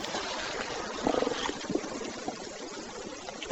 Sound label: Growl no response; EVP; The Hoffman Mansion; Spirit Chasers of the Unknown; The Hoffmen Evp 9